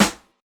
sizzly snare one shot G sharp key, acoustic snare sample tuned to the G# note; snare drum